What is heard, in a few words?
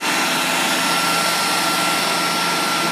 Clean